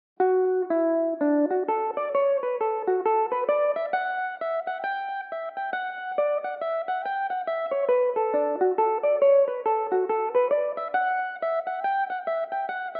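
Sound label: D major, Irish mandolin; jenny priors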